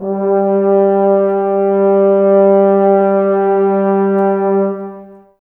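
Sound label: Brass French Horns, Woodwind Brass Ensemble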